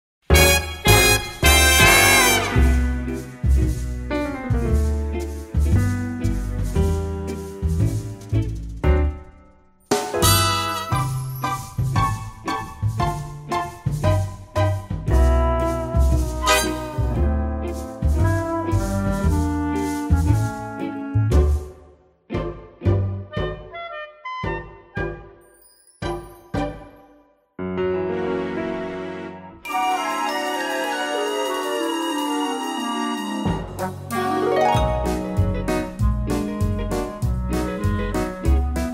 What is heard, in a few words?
Instrumental; Lord Farquaad; Duloc; academy players audition materials